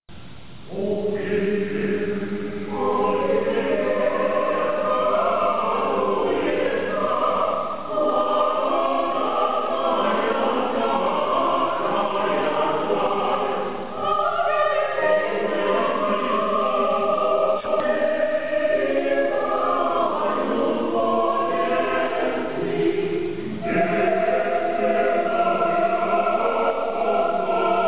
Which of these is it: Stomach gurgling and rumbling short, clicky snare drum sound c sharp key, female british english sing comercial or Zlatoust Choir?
Zlatoust Choir